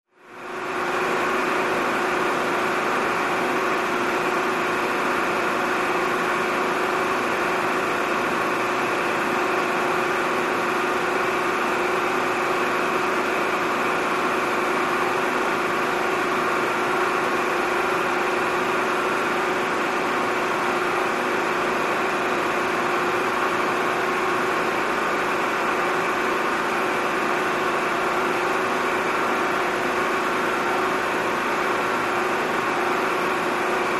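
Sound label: Industrial Machine Running, factory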